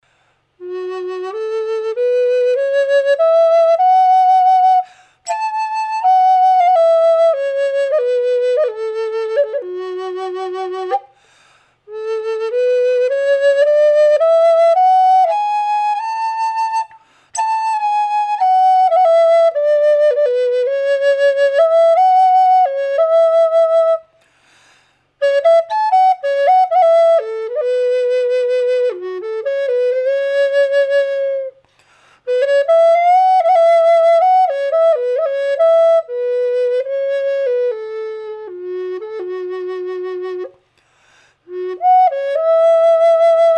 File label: amboyna f sharp dry, flutes